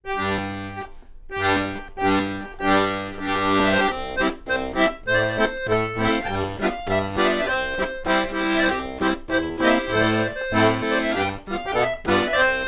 Accordion; Assassin's Creed Syndicate

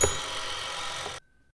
dial back

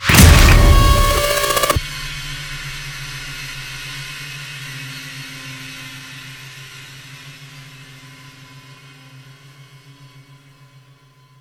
Cue End

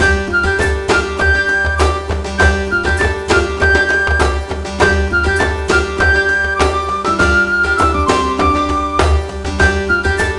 MUSIC fantasy; thrillville off the rails

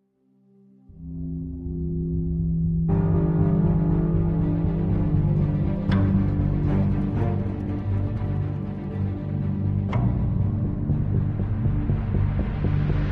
Loop Neutral; tales from the borderlands gamerip 2015

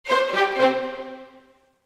Jingle Discovery

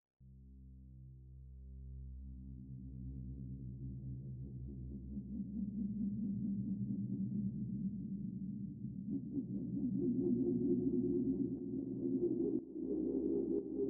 Synths II & Pads

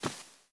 Step Slippers

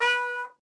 B Trumpet

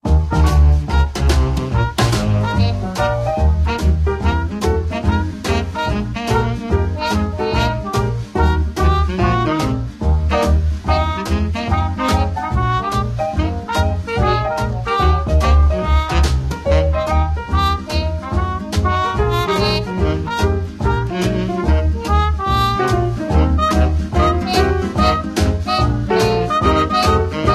Fat And Bouncy Jazz; guns gore and cannoli 2015 pc

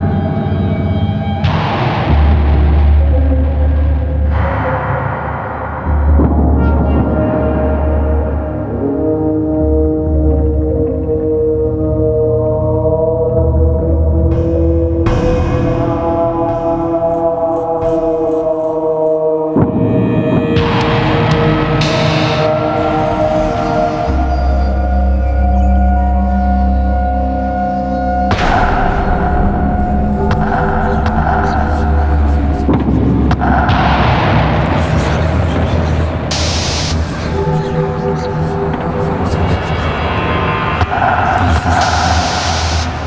Junkyard (ambience); Twisted Metal Black Online; PlayStation 2

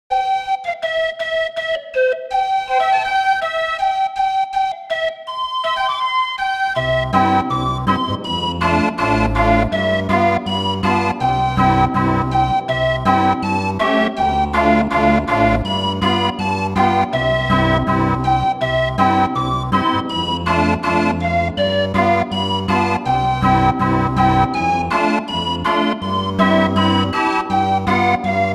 Keyboard Instrument; Assassin's Creed Syndicate

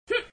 Wall Jump; Tails; Tails Nightmare 3 Demo

